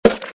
wood hit; camp rock; rock n row

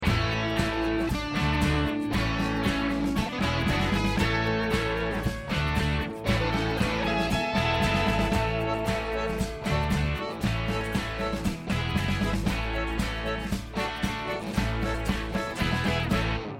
Intro Music; jakes pirate marble raceway

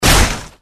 land kart; disneyxd; stuntastic

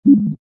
nav pitch

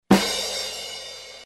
crash; disney; mashup

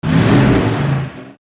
Rev, cars; SnotRod

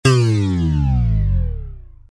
descend

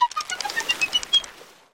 rollover boat; activity center; backyard; preschool time

